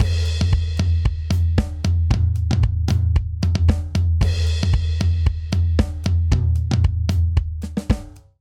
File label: Polyrhythmic Dance Chorus 114 bpm, Drum; MIDI Clips; Ableton; Factory Packs; Drum Booth